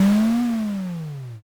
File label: lion roar